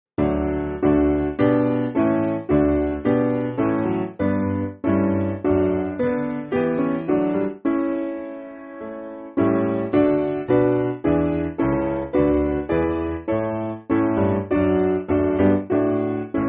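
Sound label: Piano, Music Backing Files for Churches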